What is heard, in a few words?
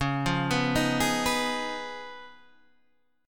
C sharp 9th C sharp Guitar Open D